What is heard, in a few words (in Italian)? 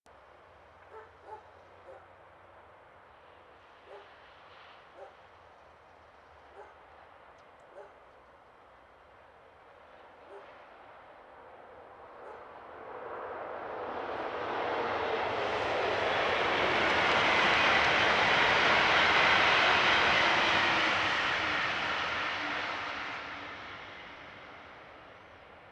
Treno mono; Eigenmike; A-weighted; Nuova Strada